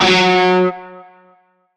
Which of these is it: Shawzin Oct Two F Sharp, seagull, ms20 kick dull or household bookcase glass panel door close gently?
Shawzin Oct Two F Sharp